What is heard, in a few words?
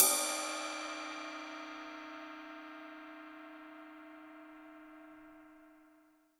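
cymbal, Real Drum Kit, FUNK KIT; Best Service ProSamples vol.43 - Real Drum Kits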